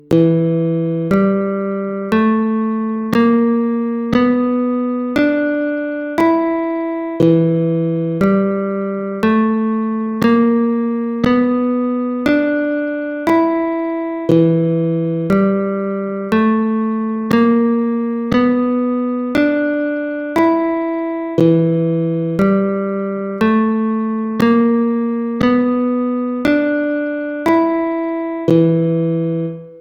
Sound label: E Blues Scale